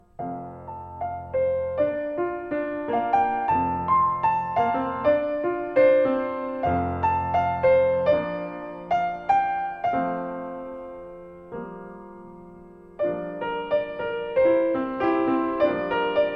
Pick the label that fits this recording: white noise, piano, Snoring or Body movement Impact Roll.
piano